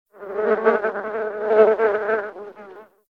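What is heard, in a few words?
beetle sound; zhuk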